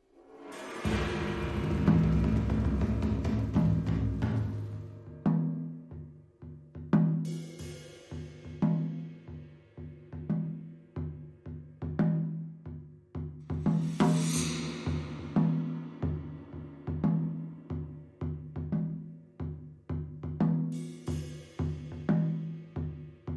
Rhythm Only

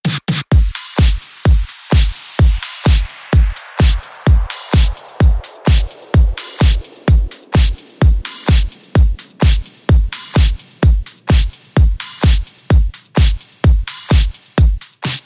Beat

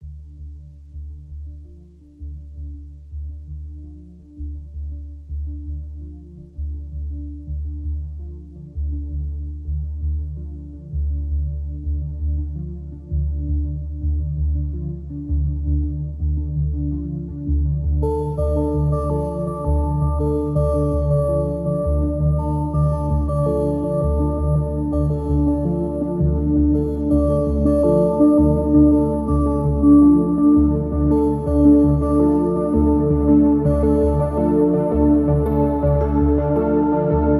Bell

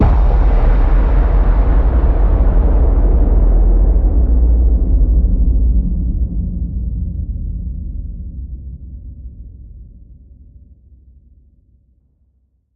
Hit Low

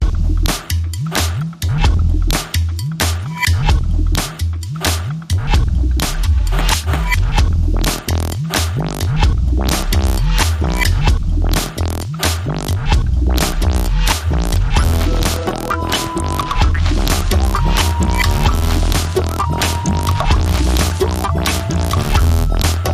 Scratch

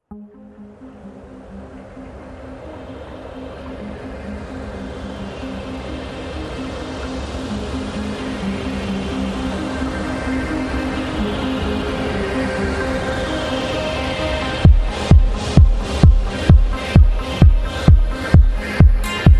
Tentation

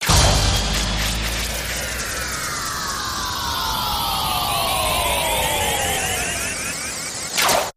Ah Yeah Sweep

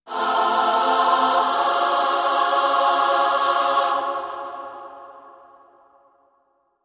Choir Only, F

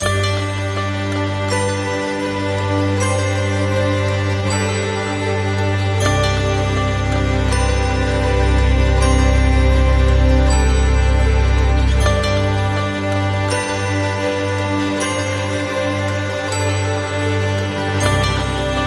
Solstice Celebration Atmos

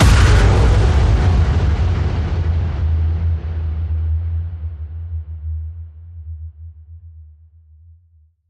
Electric Force Slam

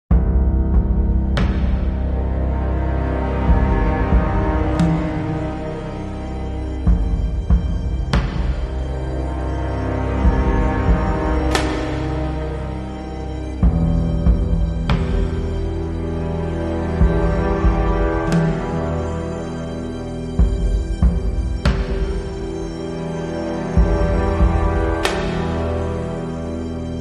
Less Percussion

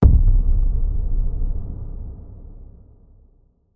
Hit

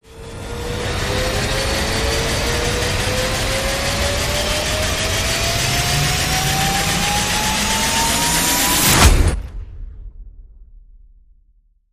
Rise Catastrophic; Rise Rhy Catastrophic

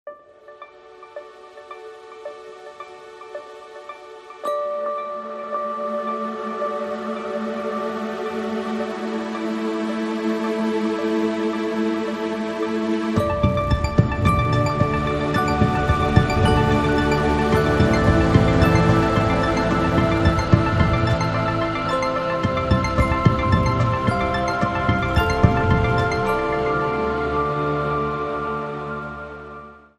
Sculpted Space

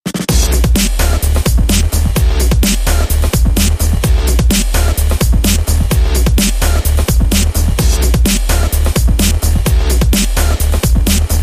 Guitar On Fire Rhythm

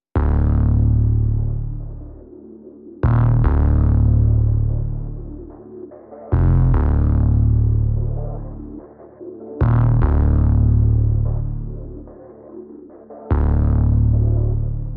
No Drums Reduced Arrangement

